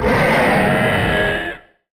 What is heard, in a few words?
monster